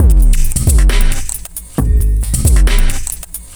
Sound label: Bpm135 Vadu Break, Dubstep Drum Loops